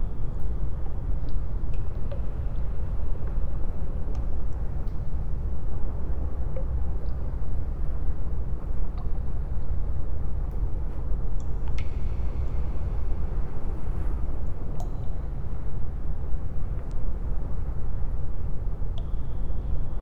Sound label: wet cave